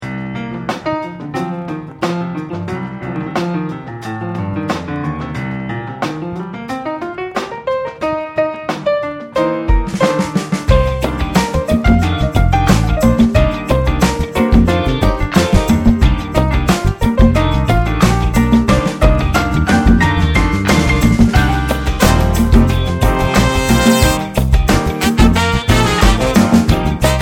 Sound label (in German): Klavier Funk Blues